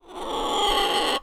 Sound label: Vulture; kendallyards